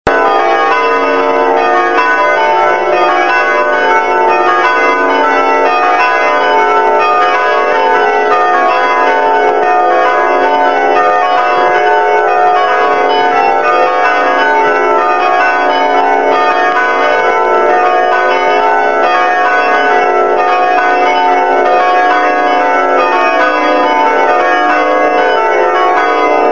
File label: bells